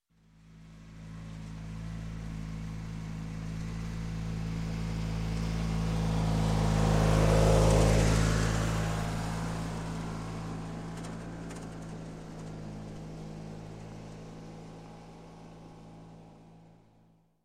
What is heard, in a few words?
Tractor, Small Pass By, Cutting Lawn, Industry; Farm; Sound Ideas 2000 Series